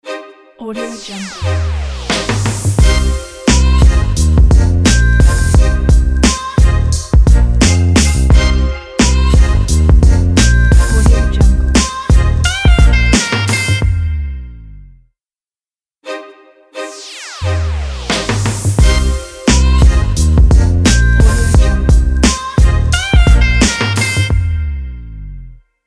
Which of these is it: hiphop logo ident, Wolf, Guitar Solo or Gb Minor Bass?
hiphop logo ident